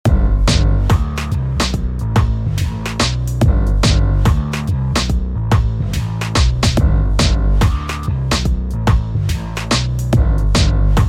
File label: Eight Oh Eight